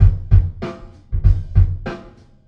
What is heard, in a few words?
Antimatter Perc, Processed Drumkits; Sonic Foundry; Sony Creative Software; Zero Gravity Beats